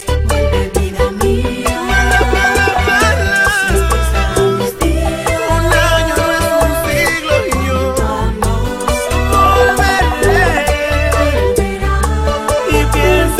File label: bachata